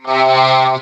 TB SYNTH, Talk Box

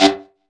SAX LICK, BRASS LICKS; Ueberschall; Jam Box

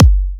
Electro Kicks

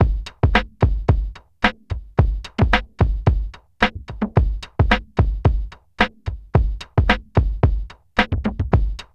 HIPHOP; INIS; Funky Xtreams

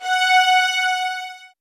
MED ATT F 7, soft violins; Q Up Arts; Denny Jaeger Master Violin Library

